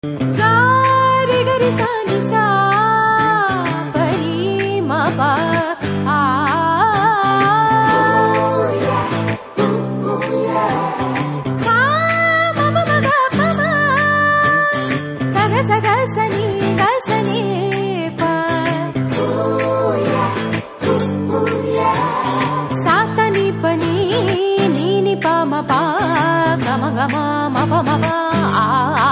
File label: Classical Humming Tone; Unnadi Okate Zindagi